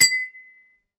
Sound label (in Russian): bokaly kasayutsya melodiya; interier